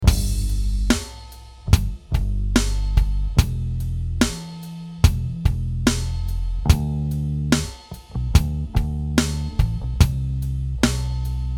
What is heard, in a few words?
DRUM AND BASS BEFORE TUBE; VTC TUBE BOOSTER; TUBE INSTANCE